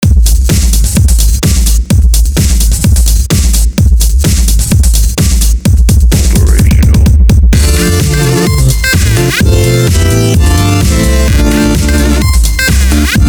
Symbiotic Square Waves

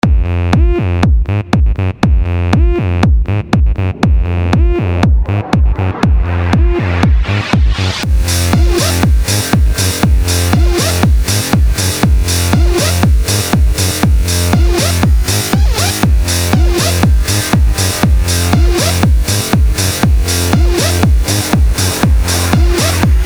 Sidechain Bob Longer, Electro

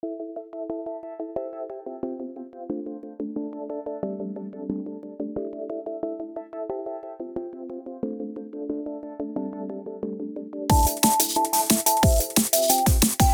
Bastardized Sinewaves

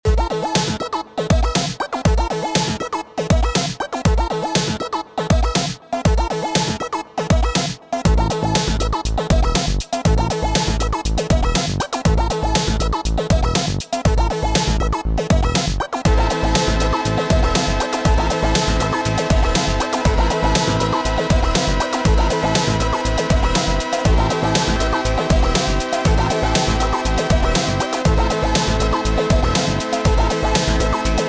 Acid Experimental Loop; Breakbeats

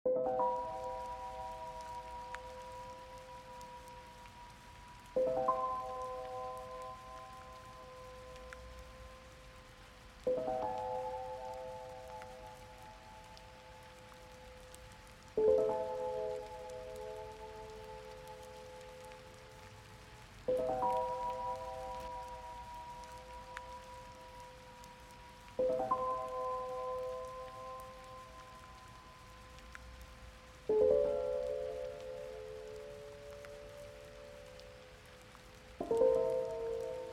Low Rez Bells that Jingle, Downtempo

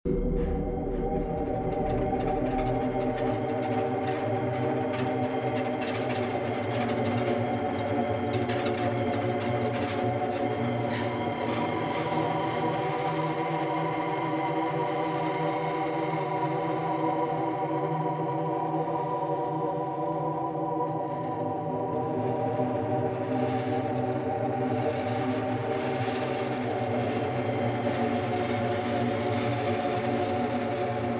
Spooky Loop; Suspense

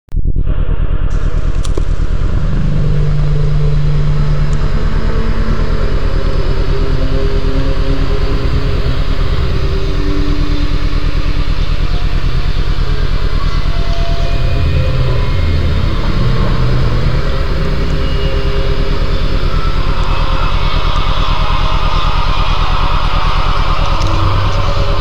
Strange Noises

